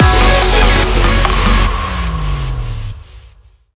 Game over; beatmania IIDX 28 BISTROVER; System BGM; Syssd Gameover

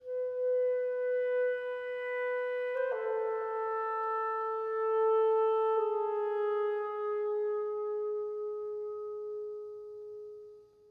84varbpm 4-4meter; Planet of Lana

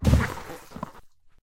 Pool Floaty Hit; Lethal Company

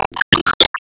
Emo Surprise

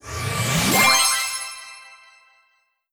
Research End

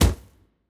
Punch

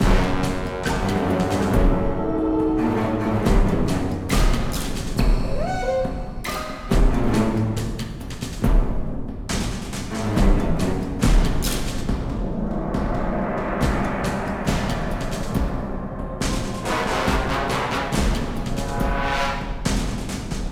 Combat

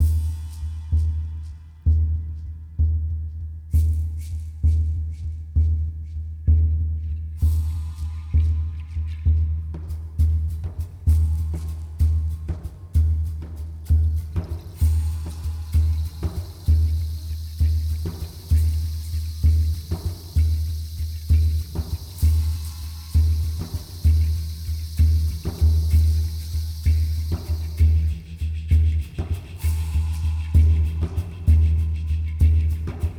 Percussion; GRINDSTONE PercussionMAP

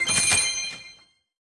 Navbar Shop; subway surfers city sound effects

